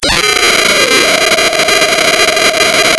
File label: pop, tearing paper